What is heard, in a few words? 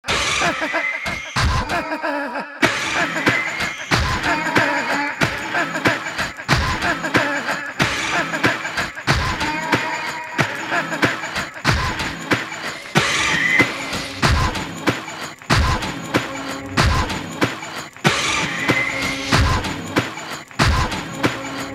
Asylum